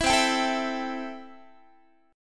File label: C 6th, Guitar; strummed; 19,20,22,19,20,x; 4A,4E,5A,5D,5G#,6C#